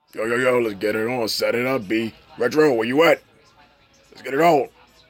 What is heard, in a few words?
Retro